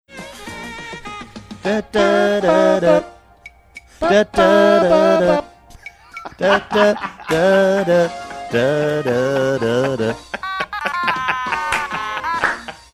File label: both singing otamatone